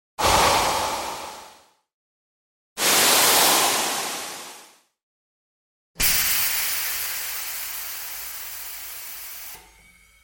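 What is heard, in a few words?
air pressure release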